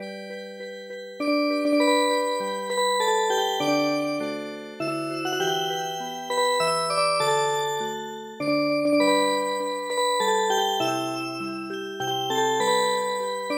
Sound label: Music Box, midi; HER BRIGHT SMILE HAUNTS ME STILL